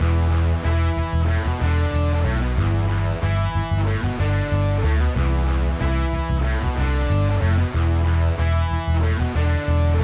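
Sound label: Bass Guitar Combo; Multitrack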